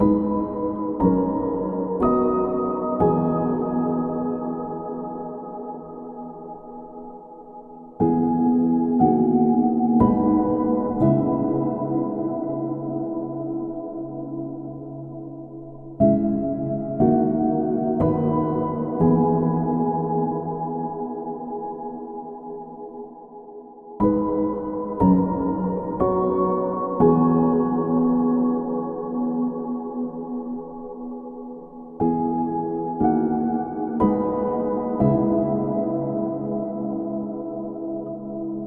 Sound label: Minimalist Piano Background Music That Evokes Emotion